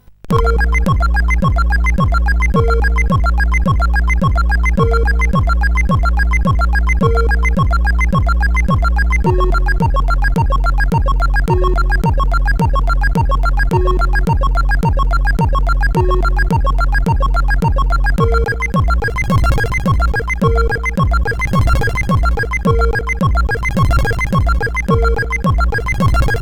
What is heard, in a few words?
Dub; Twee Dub; Isocosa; hvsc